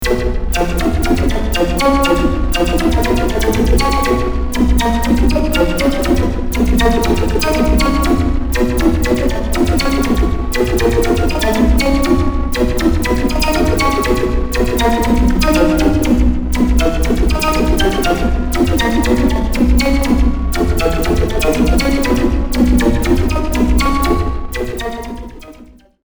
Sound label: yeehaw solo